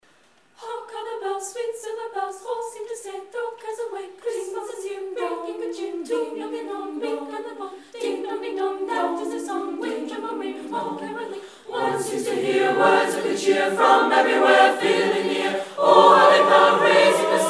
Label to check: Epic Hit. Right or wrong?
Wrong